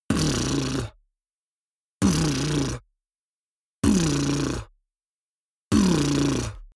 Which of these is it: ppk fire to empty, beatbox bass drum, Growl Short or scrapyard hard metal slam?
beatbox bass drum